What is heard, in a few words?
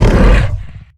Exertion Light; Evolve; Behemoth